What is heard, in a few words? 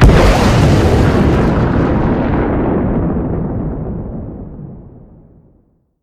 Boom; Elite Gaming FiveM; Sound-Barrier